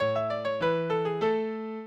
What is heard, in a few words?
minuet, mozart